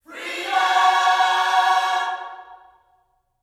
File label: FREEDOM, Gospel; Spectrasonics; Vocal Planet; GOSP PHR